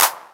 CLAP, Techno; CLAPS; 138 BPM; Best Service ProSamples vol.54; AKAI

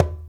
Congas, African Contemporary

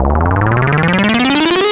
SFX, 150 BPM, Drum & Bass; Ueberschall; Drum & Bass Resonance

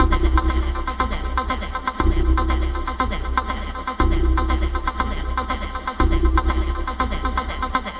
Synthetix, Processed Percussion

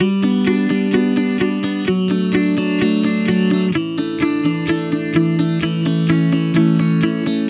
Electric Pop Guitar 128BPM Dmaj; Vengeance - Essential Clubsounds Vol.4

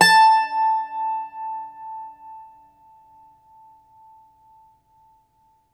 IRISH, Old World Instruments

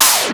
Fairlight CMI III Noise Slap

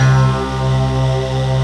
MULTI SYNTH

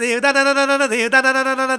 Vocals Male World Pop

